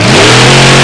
ACCEL; mtm2demo; PC Gamer 4.2

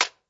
Hit

